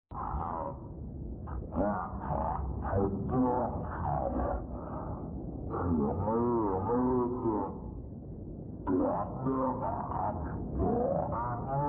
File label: burning money